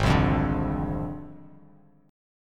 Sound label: Ab 6th Flat 5th Ab, Bass Standard; strummed; JGuitar